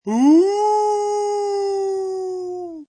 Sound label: Wolf Dry, Wolf or werewolf howling: Human, wolf-like howl, werewolf, large dog or fantasy creature; Chilling, haunting sound; Dry, non-reverberant; Wolf Sound Effects